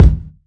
kick drum sound b key, kick tuned to the B note. Loudest frequency: 152Hz